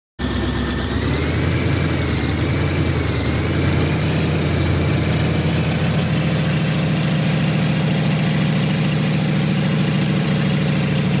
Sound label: Tank Sound And Shoot